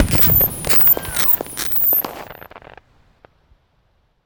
Glitch Factory Morphing; Sound Spark LLC; GLITCH FACTORY 1- BOOM, CRACKLE AND SCREAM

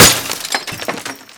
glass, bullets impact; hl2

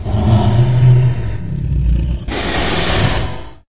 hydra 3 lung breathe loop; hl2

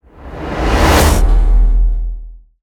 whoosh hit metal; BlueZone; Spectral Density Cinematic Samples

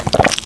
suck, headcrab poison; hl2

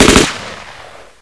ar1 3 rounds, weapons; hl2

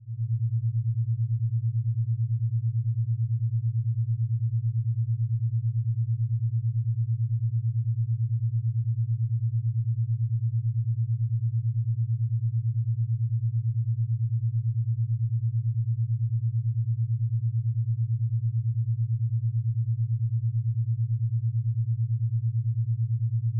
110Hz (9Hz Alpha Waves); 344 Audio; Mind States

